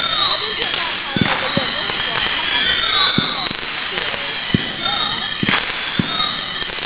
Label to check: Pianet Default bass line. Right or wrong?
Wrong